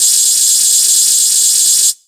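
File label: 127 drum 2 L, Fresh Disco House; USB Soundscan vol.20; AKAI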